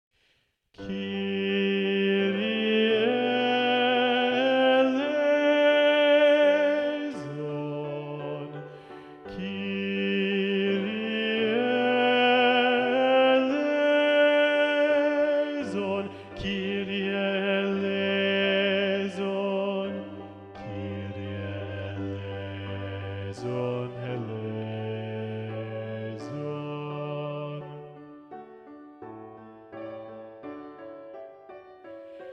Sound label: Bass; Mass No2 in G Mvt 1 Kyrie; Practice Room